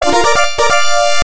Coin; Double Dribble; arcade

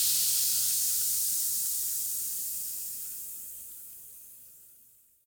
fire die

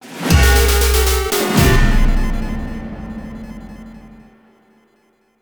Jingle Death Stinger; Bionic Commando Rearmed

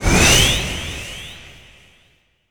Jump Air; Pac-Man Championship Edition 2 Plus

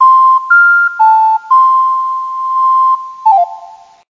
Ocarina; kentei kyoukai imasara hito ni wa kikenai otona no joushiki ryoku training ds

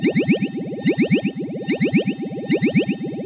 energy force spell loop

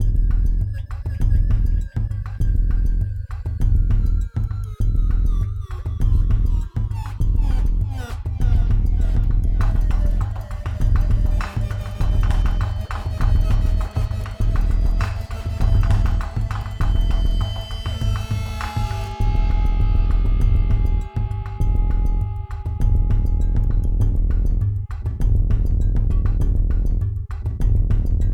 Music Neutral; Sifu; H1 Level Area=Building2 2ndPart

